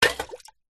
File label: household watering can small metal full of water set down on hard ground; with water splash